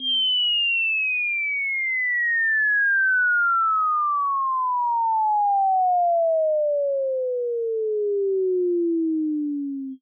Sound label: A Comically Long Fall